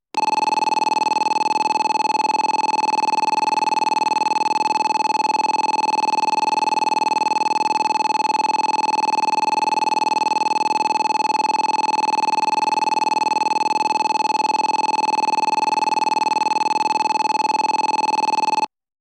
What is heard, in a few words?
bell, shin yamaguchi, st announce; 接近ベル; JR西日本 山陽新幹線新山口駅